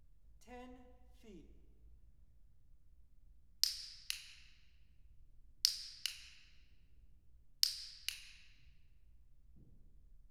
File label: Depth of Image, Acoustic Clicker 10 Feet